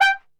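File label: G 3, flugelhorn; Best Service; Brass Super Section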